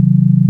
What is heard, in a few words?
sine; 音の波形と分析